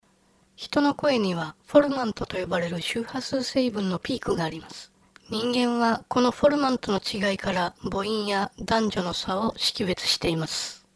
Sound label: formant test